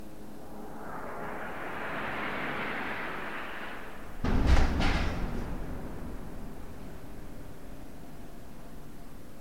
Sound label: sound of shell landing in gaza